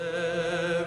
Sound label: "Ehh"